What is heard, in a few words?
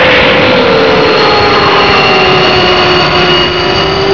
LAND; VERITECH